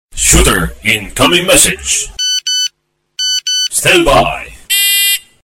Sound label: Incoming Msg